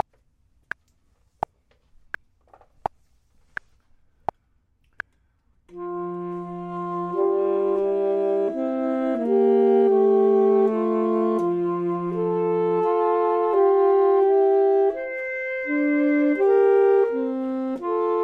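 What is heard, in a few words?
both, Saxophone and Clarinet; voxman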